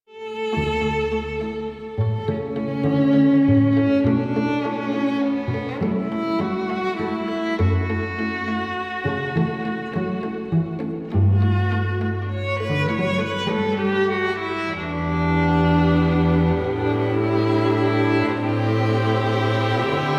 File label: Joy Cue; Duviri Paradox Quest; Diorama; Warframe; DE